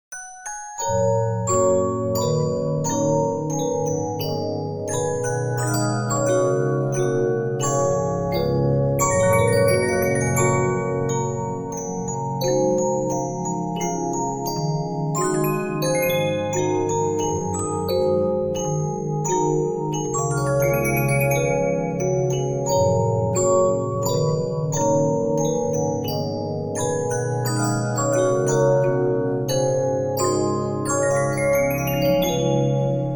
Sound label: Regina, 15inch disc musicbox